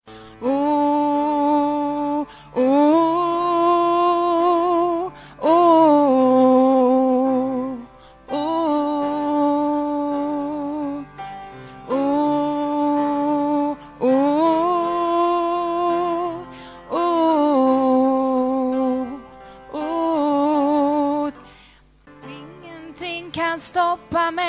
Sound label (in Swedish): sopran